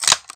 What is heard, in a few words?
bolt back, weapons; hksl8